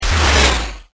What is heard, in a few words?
jumpy